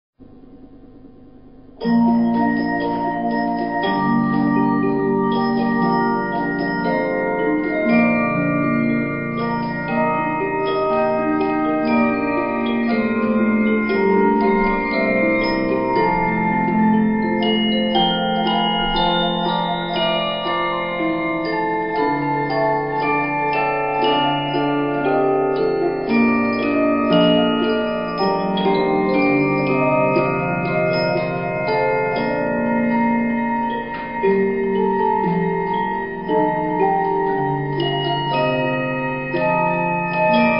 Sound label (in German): polyphon